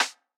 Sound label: snare